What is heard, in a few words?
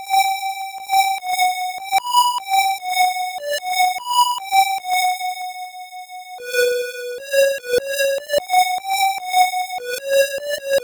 Harmonica; High School Kimengumi; Master System